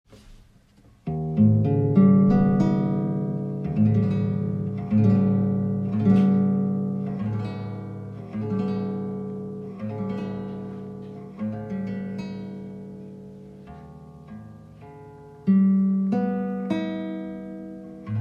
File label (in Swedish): Gitarr